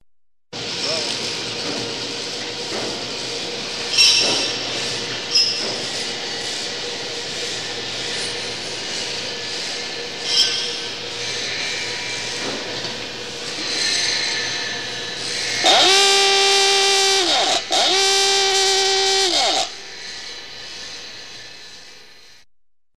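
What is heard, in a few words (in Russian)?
завод шум и звуки производства общая атмосфера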